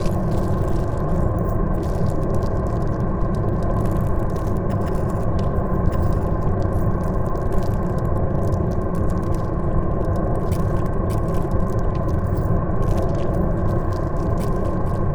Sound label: pro ship mauler idle; biogenesis